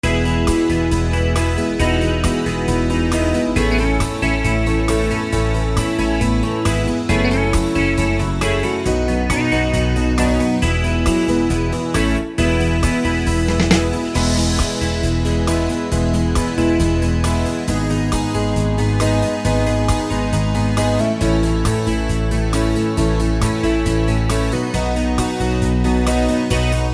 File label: californian country